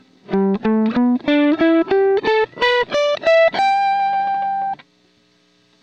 G pentatonic, guitar

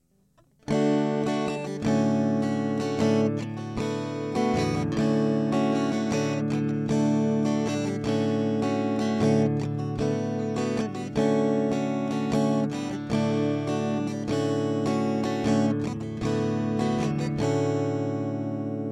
D Dmaj7 D6 Dmaj7, guitar

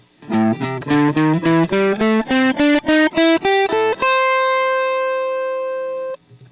blues, guitar

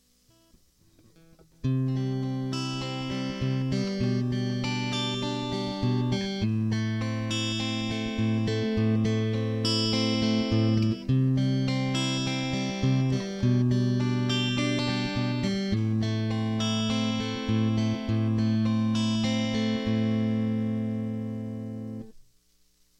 desc C G B Am, guitar

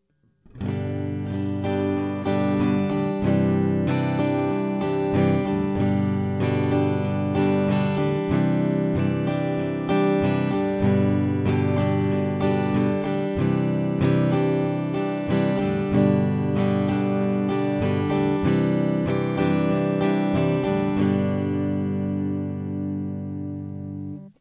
strumming accenting 1st G Cadd9, guitar

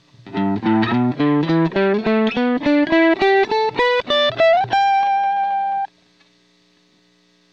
G pentatonic, guitar

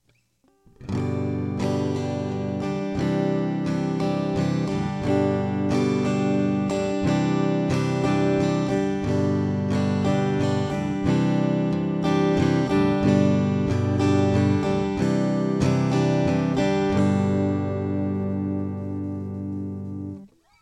strumming 3/4 accenting G Cadd9, guitar

